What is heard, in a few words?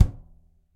Surdo Finger; Latin Percussion; Drums; Drum Hits; Ableton; Factory Packs